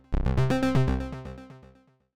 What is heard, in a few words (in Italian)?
suoneria botton; beep